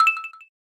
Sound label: Timer Countdown